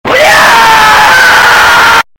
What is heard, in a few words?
russia kid scream